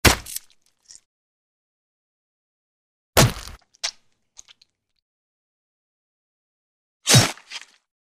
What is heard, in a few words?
The sword hits the body; cold weapons